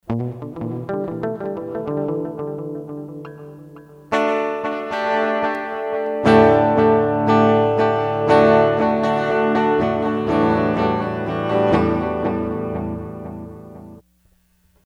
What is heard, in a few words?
Picks; Sony HR-GP5